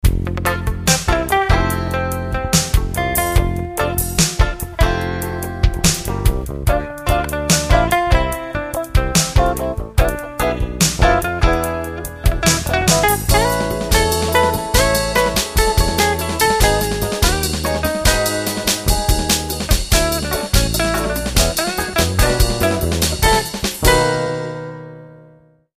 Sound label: Fun Samba